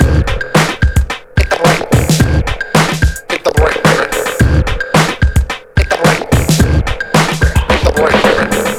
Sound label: 109 bpm, Breaks; HIT THE BREAK; 90 s Sample CDs; Planet of the Breaks